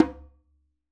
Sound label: djembe Single Hit Right Hand Tone; Raw Perc One Shots; Slate; SD SEISMIC Drum Sample Pack